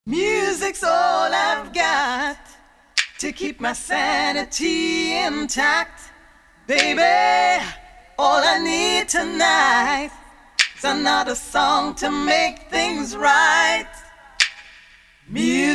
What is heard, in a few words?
62 BPM